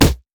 blue minion melee basic attack; LoL

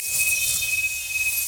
hitenstylecharged loop; LoL; irelia

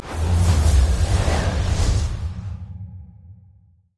blast wind; lissandra skin01

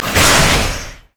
blades attack; LoL; Nocturne; umbra blades attack

